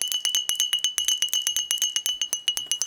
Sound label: bell